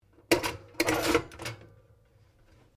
TOASTER OLD LOWER; Various kitchen appliances; Household

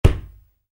Soccer Ball: Kick Ball; Football kick; Sports; Stuart Duffield

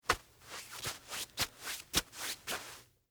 Winter Gloves Adjust, Fingers; Foley; Dressing; BlastWave

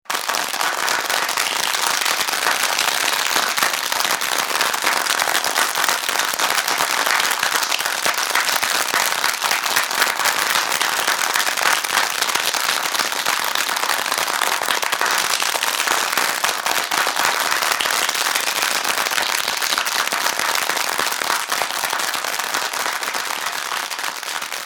Large group of people applauding; Crowd Applause; Applause (Sound Effects:Human)

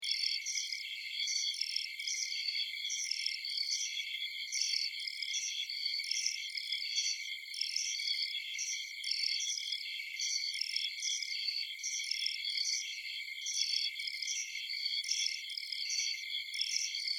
Amb Night Forest, insects; Ambience, Insects, Chirps, Night, Field, Bugs; Forests And Jungle; Backgrounds

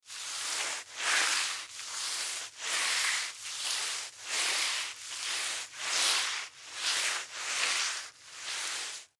Mop Floor Movement, Mop Floor Movement Long; Cleaning; Household; Blastwave

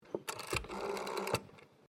TELEPHONE WOODEN AUTOMATIC DIALING WALLPHONE DIAL; Various old telephones; Telephone Sound Effects; Electronics

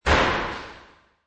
Metal Slam; Loud metallic slam; Michael Huang

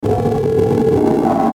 Static Low Scrape; Static Low Scrape Descend; Production Element Imaging Element Accent Transition; Blastwave; Static Sound Effects

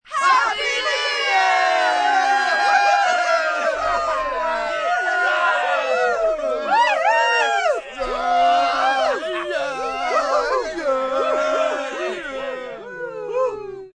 Group New Year, Cheering, large group

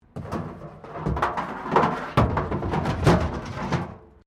METAL BIN SCRAPE PLASTIC CRASH BARRIER

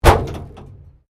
WRECK DOOR PANEL SLAM HEAVY, Wrecked Cars; Wrecked Cars, impacts, crashes, scrapes; Crashes (Sound Effects:Objects)

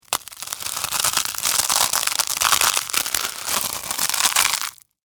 Crunch Ramen Noodles; Texture; Crunches; Objects; BlastWave

